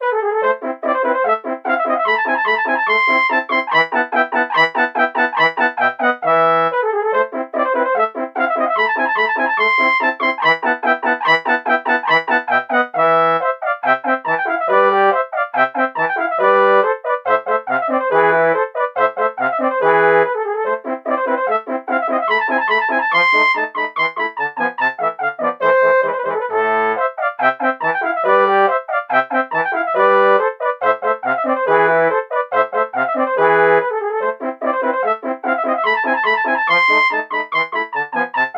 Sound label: trombone